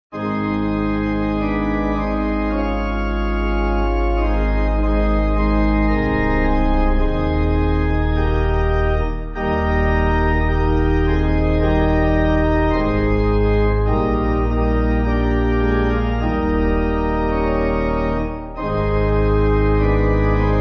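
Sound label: Pipe, pipe organ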